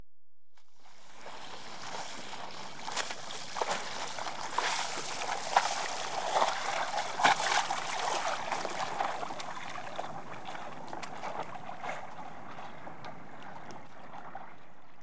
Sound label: Pass (Recorded From Nearby Boat); BBC Sound Effects Library; Adventure Sports